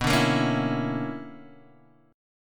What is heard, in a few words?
B-Minor Major 11th; BmM11 Chord {7 5 8 6 5 X} strummed